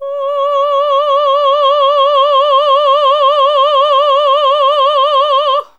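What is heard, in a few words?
classical female C#4, choir; Best Service Gigapack 1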